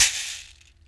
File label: berimbau